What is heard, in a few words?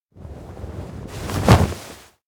struggle hugged; anemoi